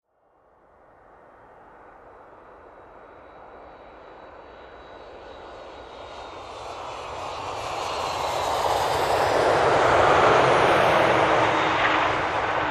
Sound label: Aircraft on runway about to take off passed by